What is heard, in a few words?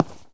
Footstep Concrete Run